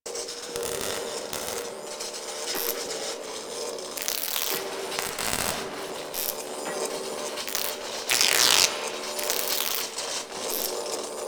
Electric Sparks Prop Loop; Props; Ambience

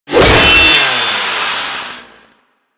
Saw Impact Default, Blade Impacts; Warframe; Grineer

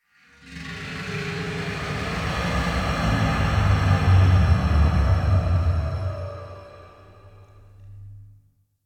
Exhale Interior Small, Ambience

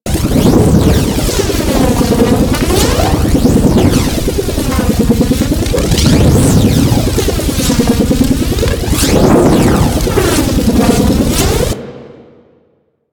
Universe Ring Loop; Warframe; Warframes; Nezha

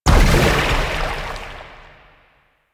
Water Tomb Release; Warframe; Yareli

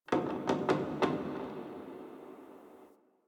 Wooden Creak Small; Ambience; Warframe; NineteenNinetyNine

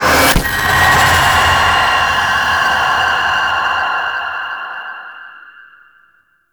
Abyss Resource Pickup; Warframe; Abyss Of Dagath

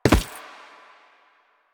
Step Default, Footsteps; Warframe; Lasrian; Lasrian Soldiers; Foley; Lasrian Butcher

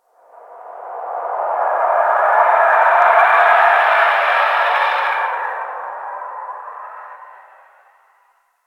Screaming Space; Ambience; Exterior; Warframe; Railjack

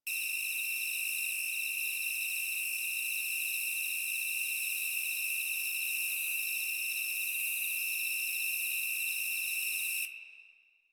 Insects High Loop F; Ambience; Props; Loops; Warframe; Eidolon Plains

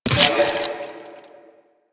Mech, Weapons; DE; Warframe; Sentient; Shedu

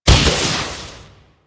Vial Corrosive Shatter; Warframe; DE; Warframes; Alchemist; Elements; Ability

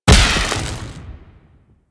Bullet Jump Electricity; Parkour; Mods

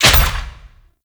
Melee Slam Impact; Warframe; Necramech

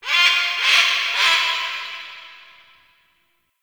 Bird Ruckus Vocal; Warframe; Quests; Entrati Labs

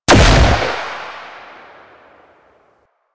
Shot Single, Pistol; Weapons; Grineer; Amphis

